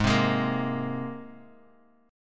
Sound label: G sharp Major Add 9th G sharp, Guitar Open G